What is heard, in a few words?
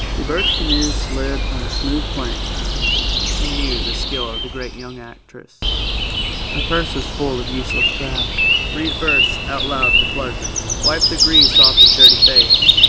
birds